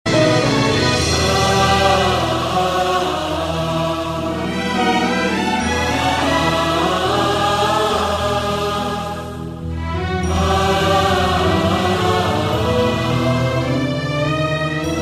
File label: Emotional BGM Ringtone